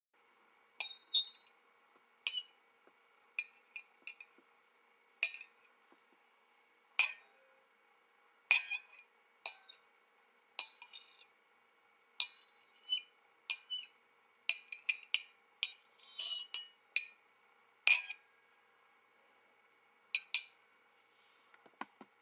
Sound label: Utensil Clink